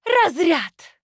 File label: Female Human Attack